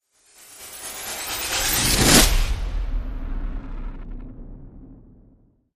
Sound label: Rise Short Blackout